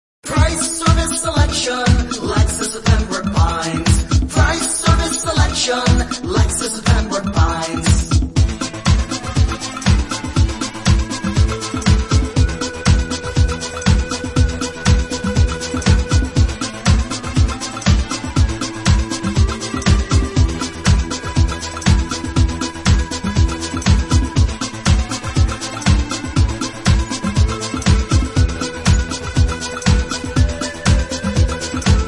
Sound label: jingles